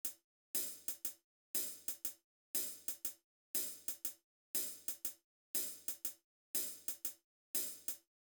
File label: swing